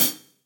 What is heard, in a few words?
Hihat Closed; Drum Hits; Standard Hits; Studio Basic; Ableton; Factory Packs; Session Drums Studio